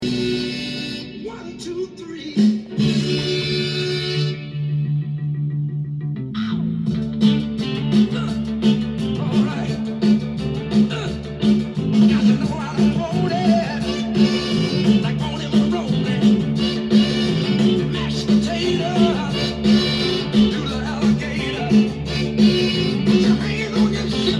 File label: Rhythm Blues De Luxe; オムニバス